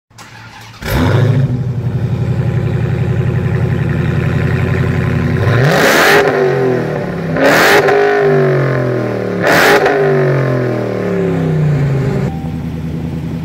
Dodge Challenger SRT HELLCAT Stock Oem, car engine